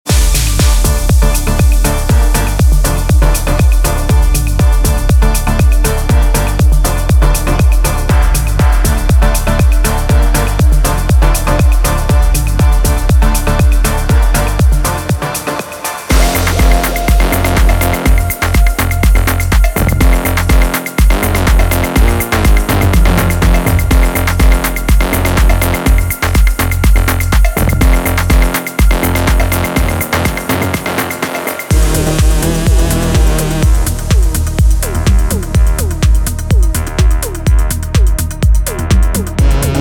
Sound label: Afro Tech Dj Mix, melodic and percussive sound pack savanna; PML